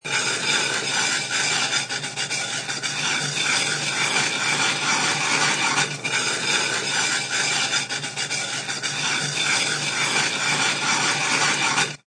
Scrub Pan, High quality scrubbing pan with bristle brush and running water; Cleaning Sound Effects; Household